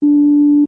Beeps Single Tones Sh

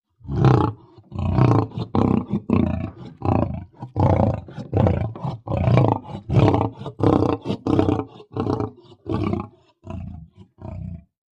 Lion Breaths Growl; big cats

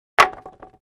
2x4 Plank Dropped On

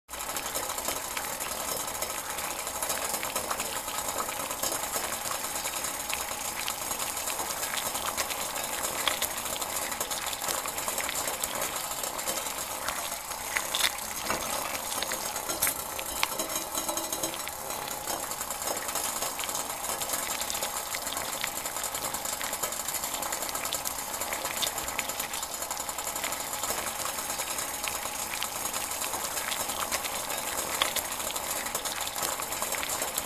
Boil Water On Stove

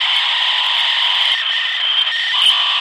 radio jamming

